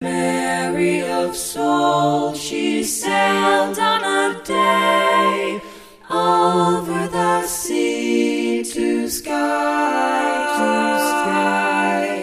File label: skye boat song all parts